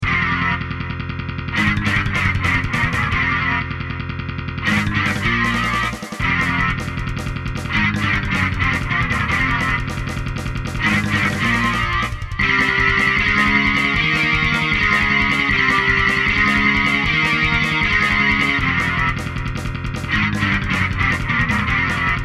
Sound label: metal